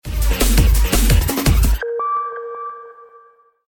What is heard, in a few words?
Message tone; Samsung; GT-S5520 Nori; Alert tones